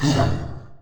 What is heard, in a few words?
grunts; finalmother